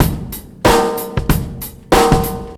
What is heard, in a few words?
memphis soul, Drum loops Boombap